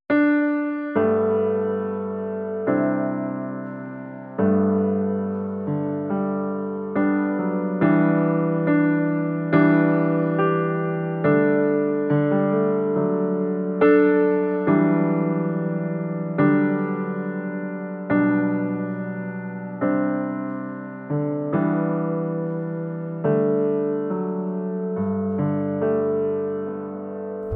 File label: Evening Prayer Backing Track